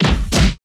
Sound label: FIST HIT; Total Control - Action Cutz